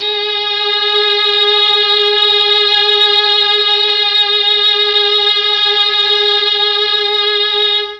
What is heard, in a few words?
G#2 3 violins, Mellotron MKII; Mike Pinder - Mellotron Archive CD